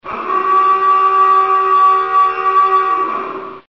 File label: Danger alarm